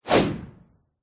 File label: sound design fast whoosh; zapsplat